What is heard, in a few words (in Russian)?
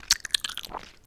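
сочный громкий звук чавканья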